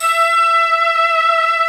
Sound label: SYNTH PAD